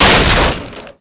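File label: break wood large; Spearhead Demo; MacFormat 2003 June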